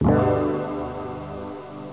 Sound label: KLICK